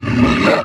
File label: vox attack